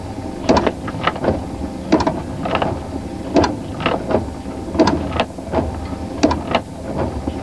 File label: windshield wipers; Sound Effects for Multimedia - Volume 1